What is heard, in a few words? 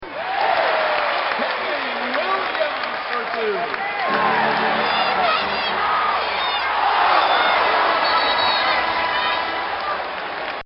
Basketball Crowd; Basketball (Sound Effects:Sports)